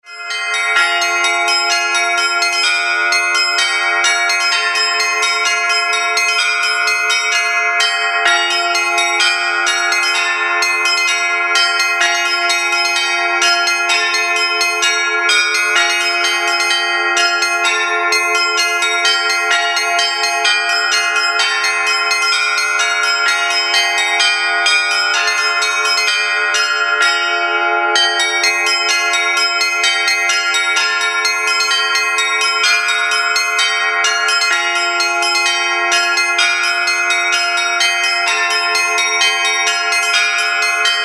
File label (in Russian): zvon